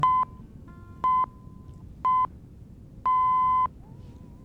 News Beeps

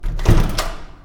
Door

